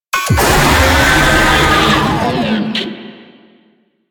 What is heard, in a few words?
Proto Infested Babau Player Spotted, Vocals